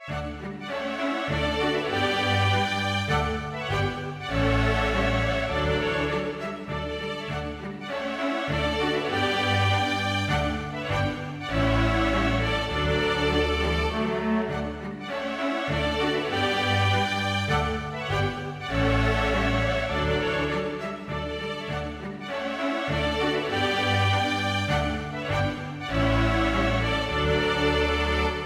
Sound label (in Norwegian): Menuet for strykekvintett; Hertz